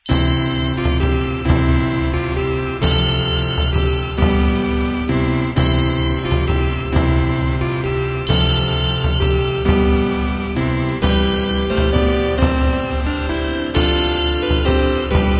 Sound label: Electronica 8-bit; Canarie